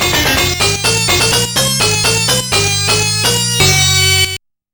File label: heli die, Trackers; Rogue Male; Trackers Modland